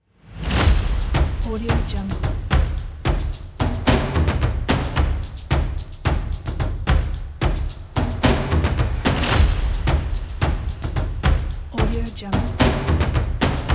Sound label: percussion